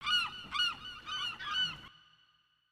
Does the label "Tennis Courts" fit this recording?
No